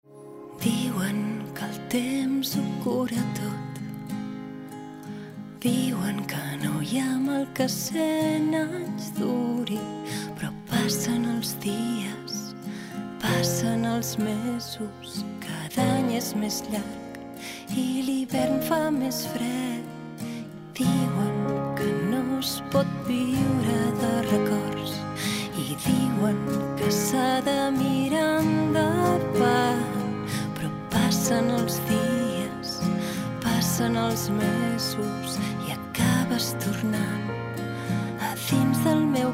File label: female catalan sing pop song; ideasonora